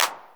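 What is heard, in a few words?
HANDCLAP, 909; drumkits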